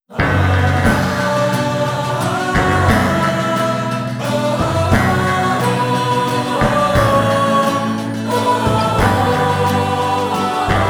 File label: Victory Song 2 Crowd; Metal Gear Solid 4 Guns of the Patriots